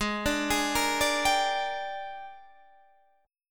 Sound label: A sharp 13th